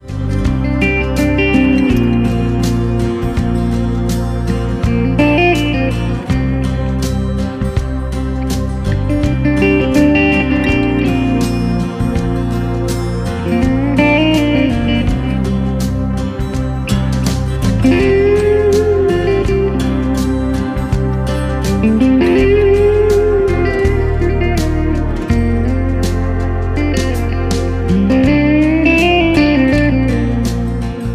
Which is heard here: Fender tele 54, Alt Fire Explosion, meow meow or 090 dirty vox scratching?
Fender tele 54